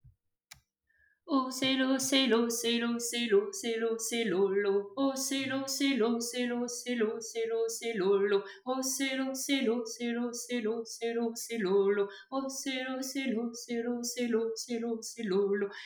voix 2

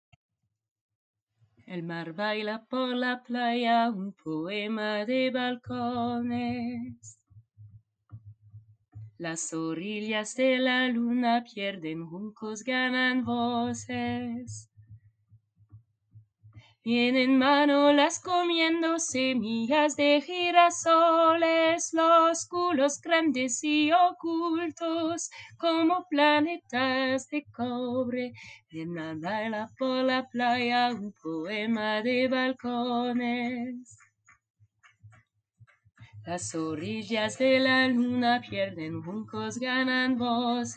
Voix lead

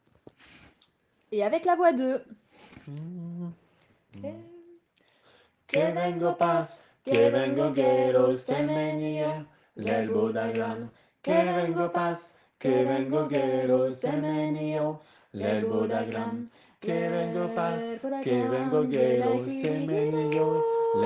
2 voix; Lerba L herbad agrama

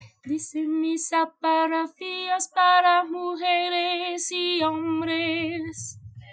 Dice misa voix aigue

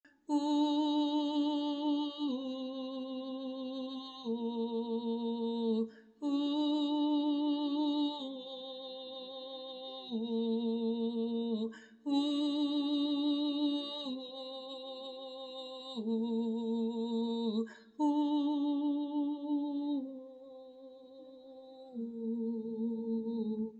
voix grave

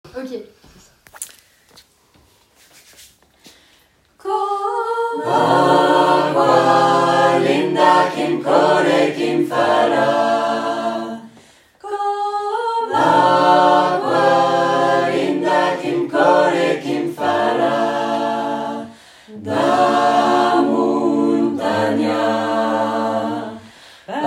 Corse Chant corse 3 voix

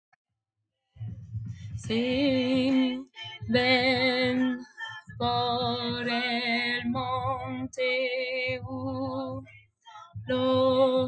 Refrain contre chant voix grave

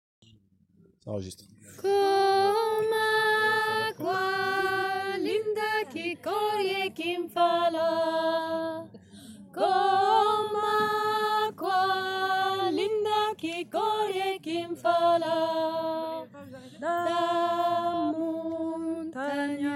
chant corse voix haute

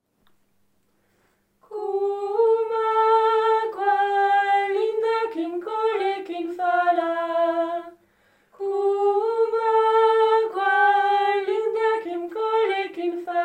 Corse, Voix Haute; chant corse

